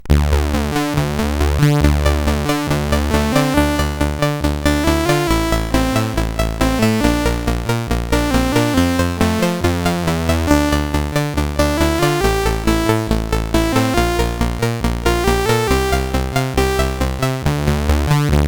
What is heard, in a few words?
Block n Bubble sid CSG8580R5; hvsc